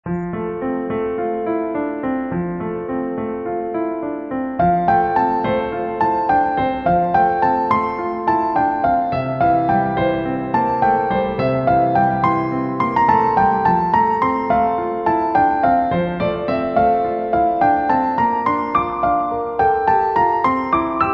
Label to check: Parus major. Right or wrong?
Wrong